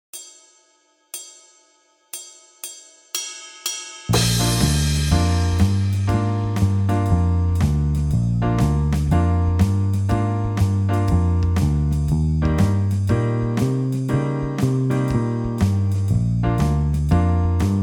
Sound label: saxophone; mib